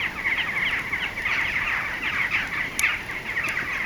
Campfire Birds; outdoor; Camping

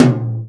tom, Real Drum Kit, jungle kit

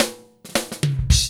FILL, Funky Ass Loops

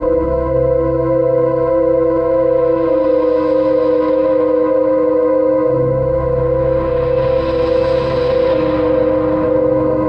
Pad Creeper ground, Drum & Bass Pads; Sonic Foundry (Sony Creative Software); Knutrix Drum & Bass

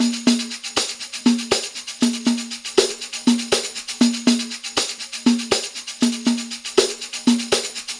PERLP, ArabianTraditions

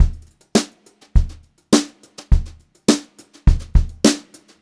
BEAT, Brushed Drum Loops

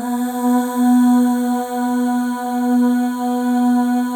Voices, FEM AHS; Spectrasonics; FEM.2 AHS

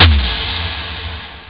tomtom; totalrecall; ST-XX Amiga Soundtracker Sample Packs

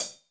HH, Drums; Bob Clearmountain Drums I

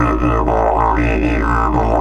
120, Didgeridoo; Didgeridoo and Other Primitive Instruments; 120BPM

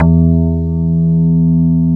WOOD BAS

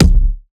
Kick, Dance Drum Kit; 99Sounds x Monosounds; Twilight

